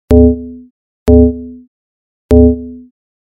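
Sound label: bass clarinet fm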